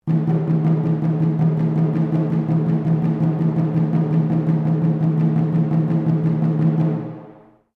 Drum Taiko Cylinder Rope Sequence, Japanese cylinder taiko; Miscellaneous Musical